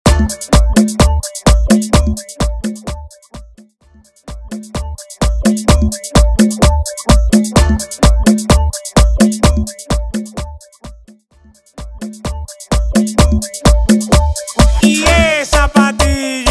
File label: Intro Outro Dirty 128 BPM Dembow